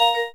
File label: cam stop; LG; KU3800 Bubble Pop